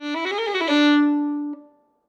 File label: Violin Whole Note Runs